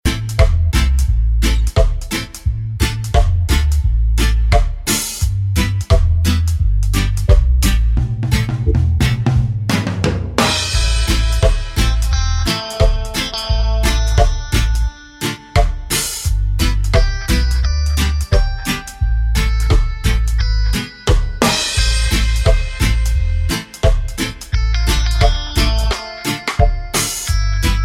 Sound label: Cool Reggae